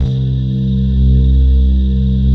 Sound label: SOFT PLUCK RS, pads; Pro-Rec - Synthsonic